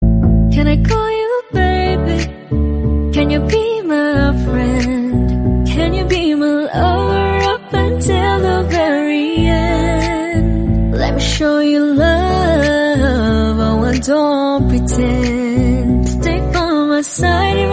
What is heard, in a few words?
bgm, english